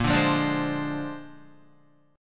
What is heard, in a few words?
Bb 9th Bb down Guitar 4F,4A,5C,5G,5C,6E; strummed; JGuitar